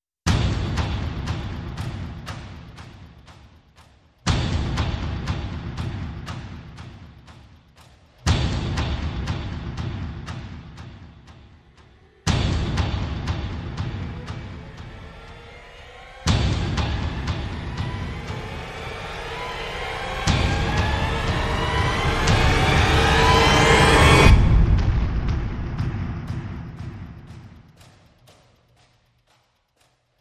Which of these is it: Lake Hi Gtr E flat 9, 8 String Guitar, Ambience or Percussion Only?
Percussion Only